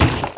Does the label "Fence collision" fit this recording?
Yes